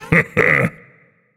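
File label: non-verbal heh heh; smite; atlas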